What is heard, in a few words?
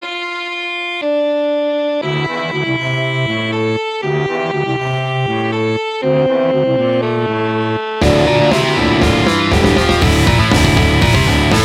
Metal Band